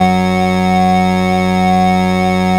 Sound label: SIEL, String Machines